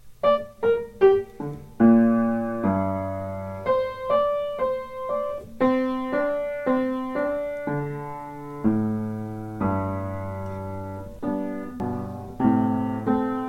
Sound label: Piano Music